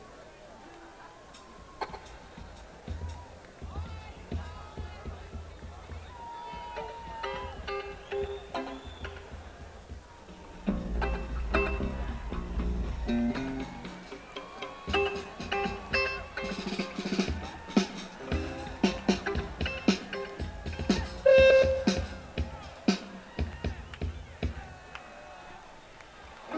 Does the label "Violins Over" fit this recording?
No